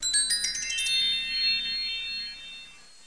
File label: sun windo